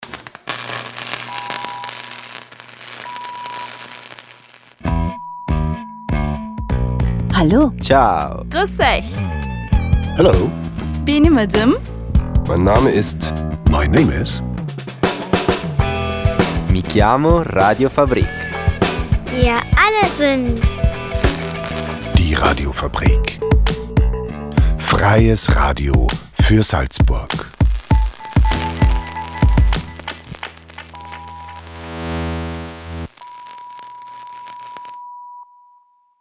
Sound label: Jingle; Radiofabrik; nachtfahrt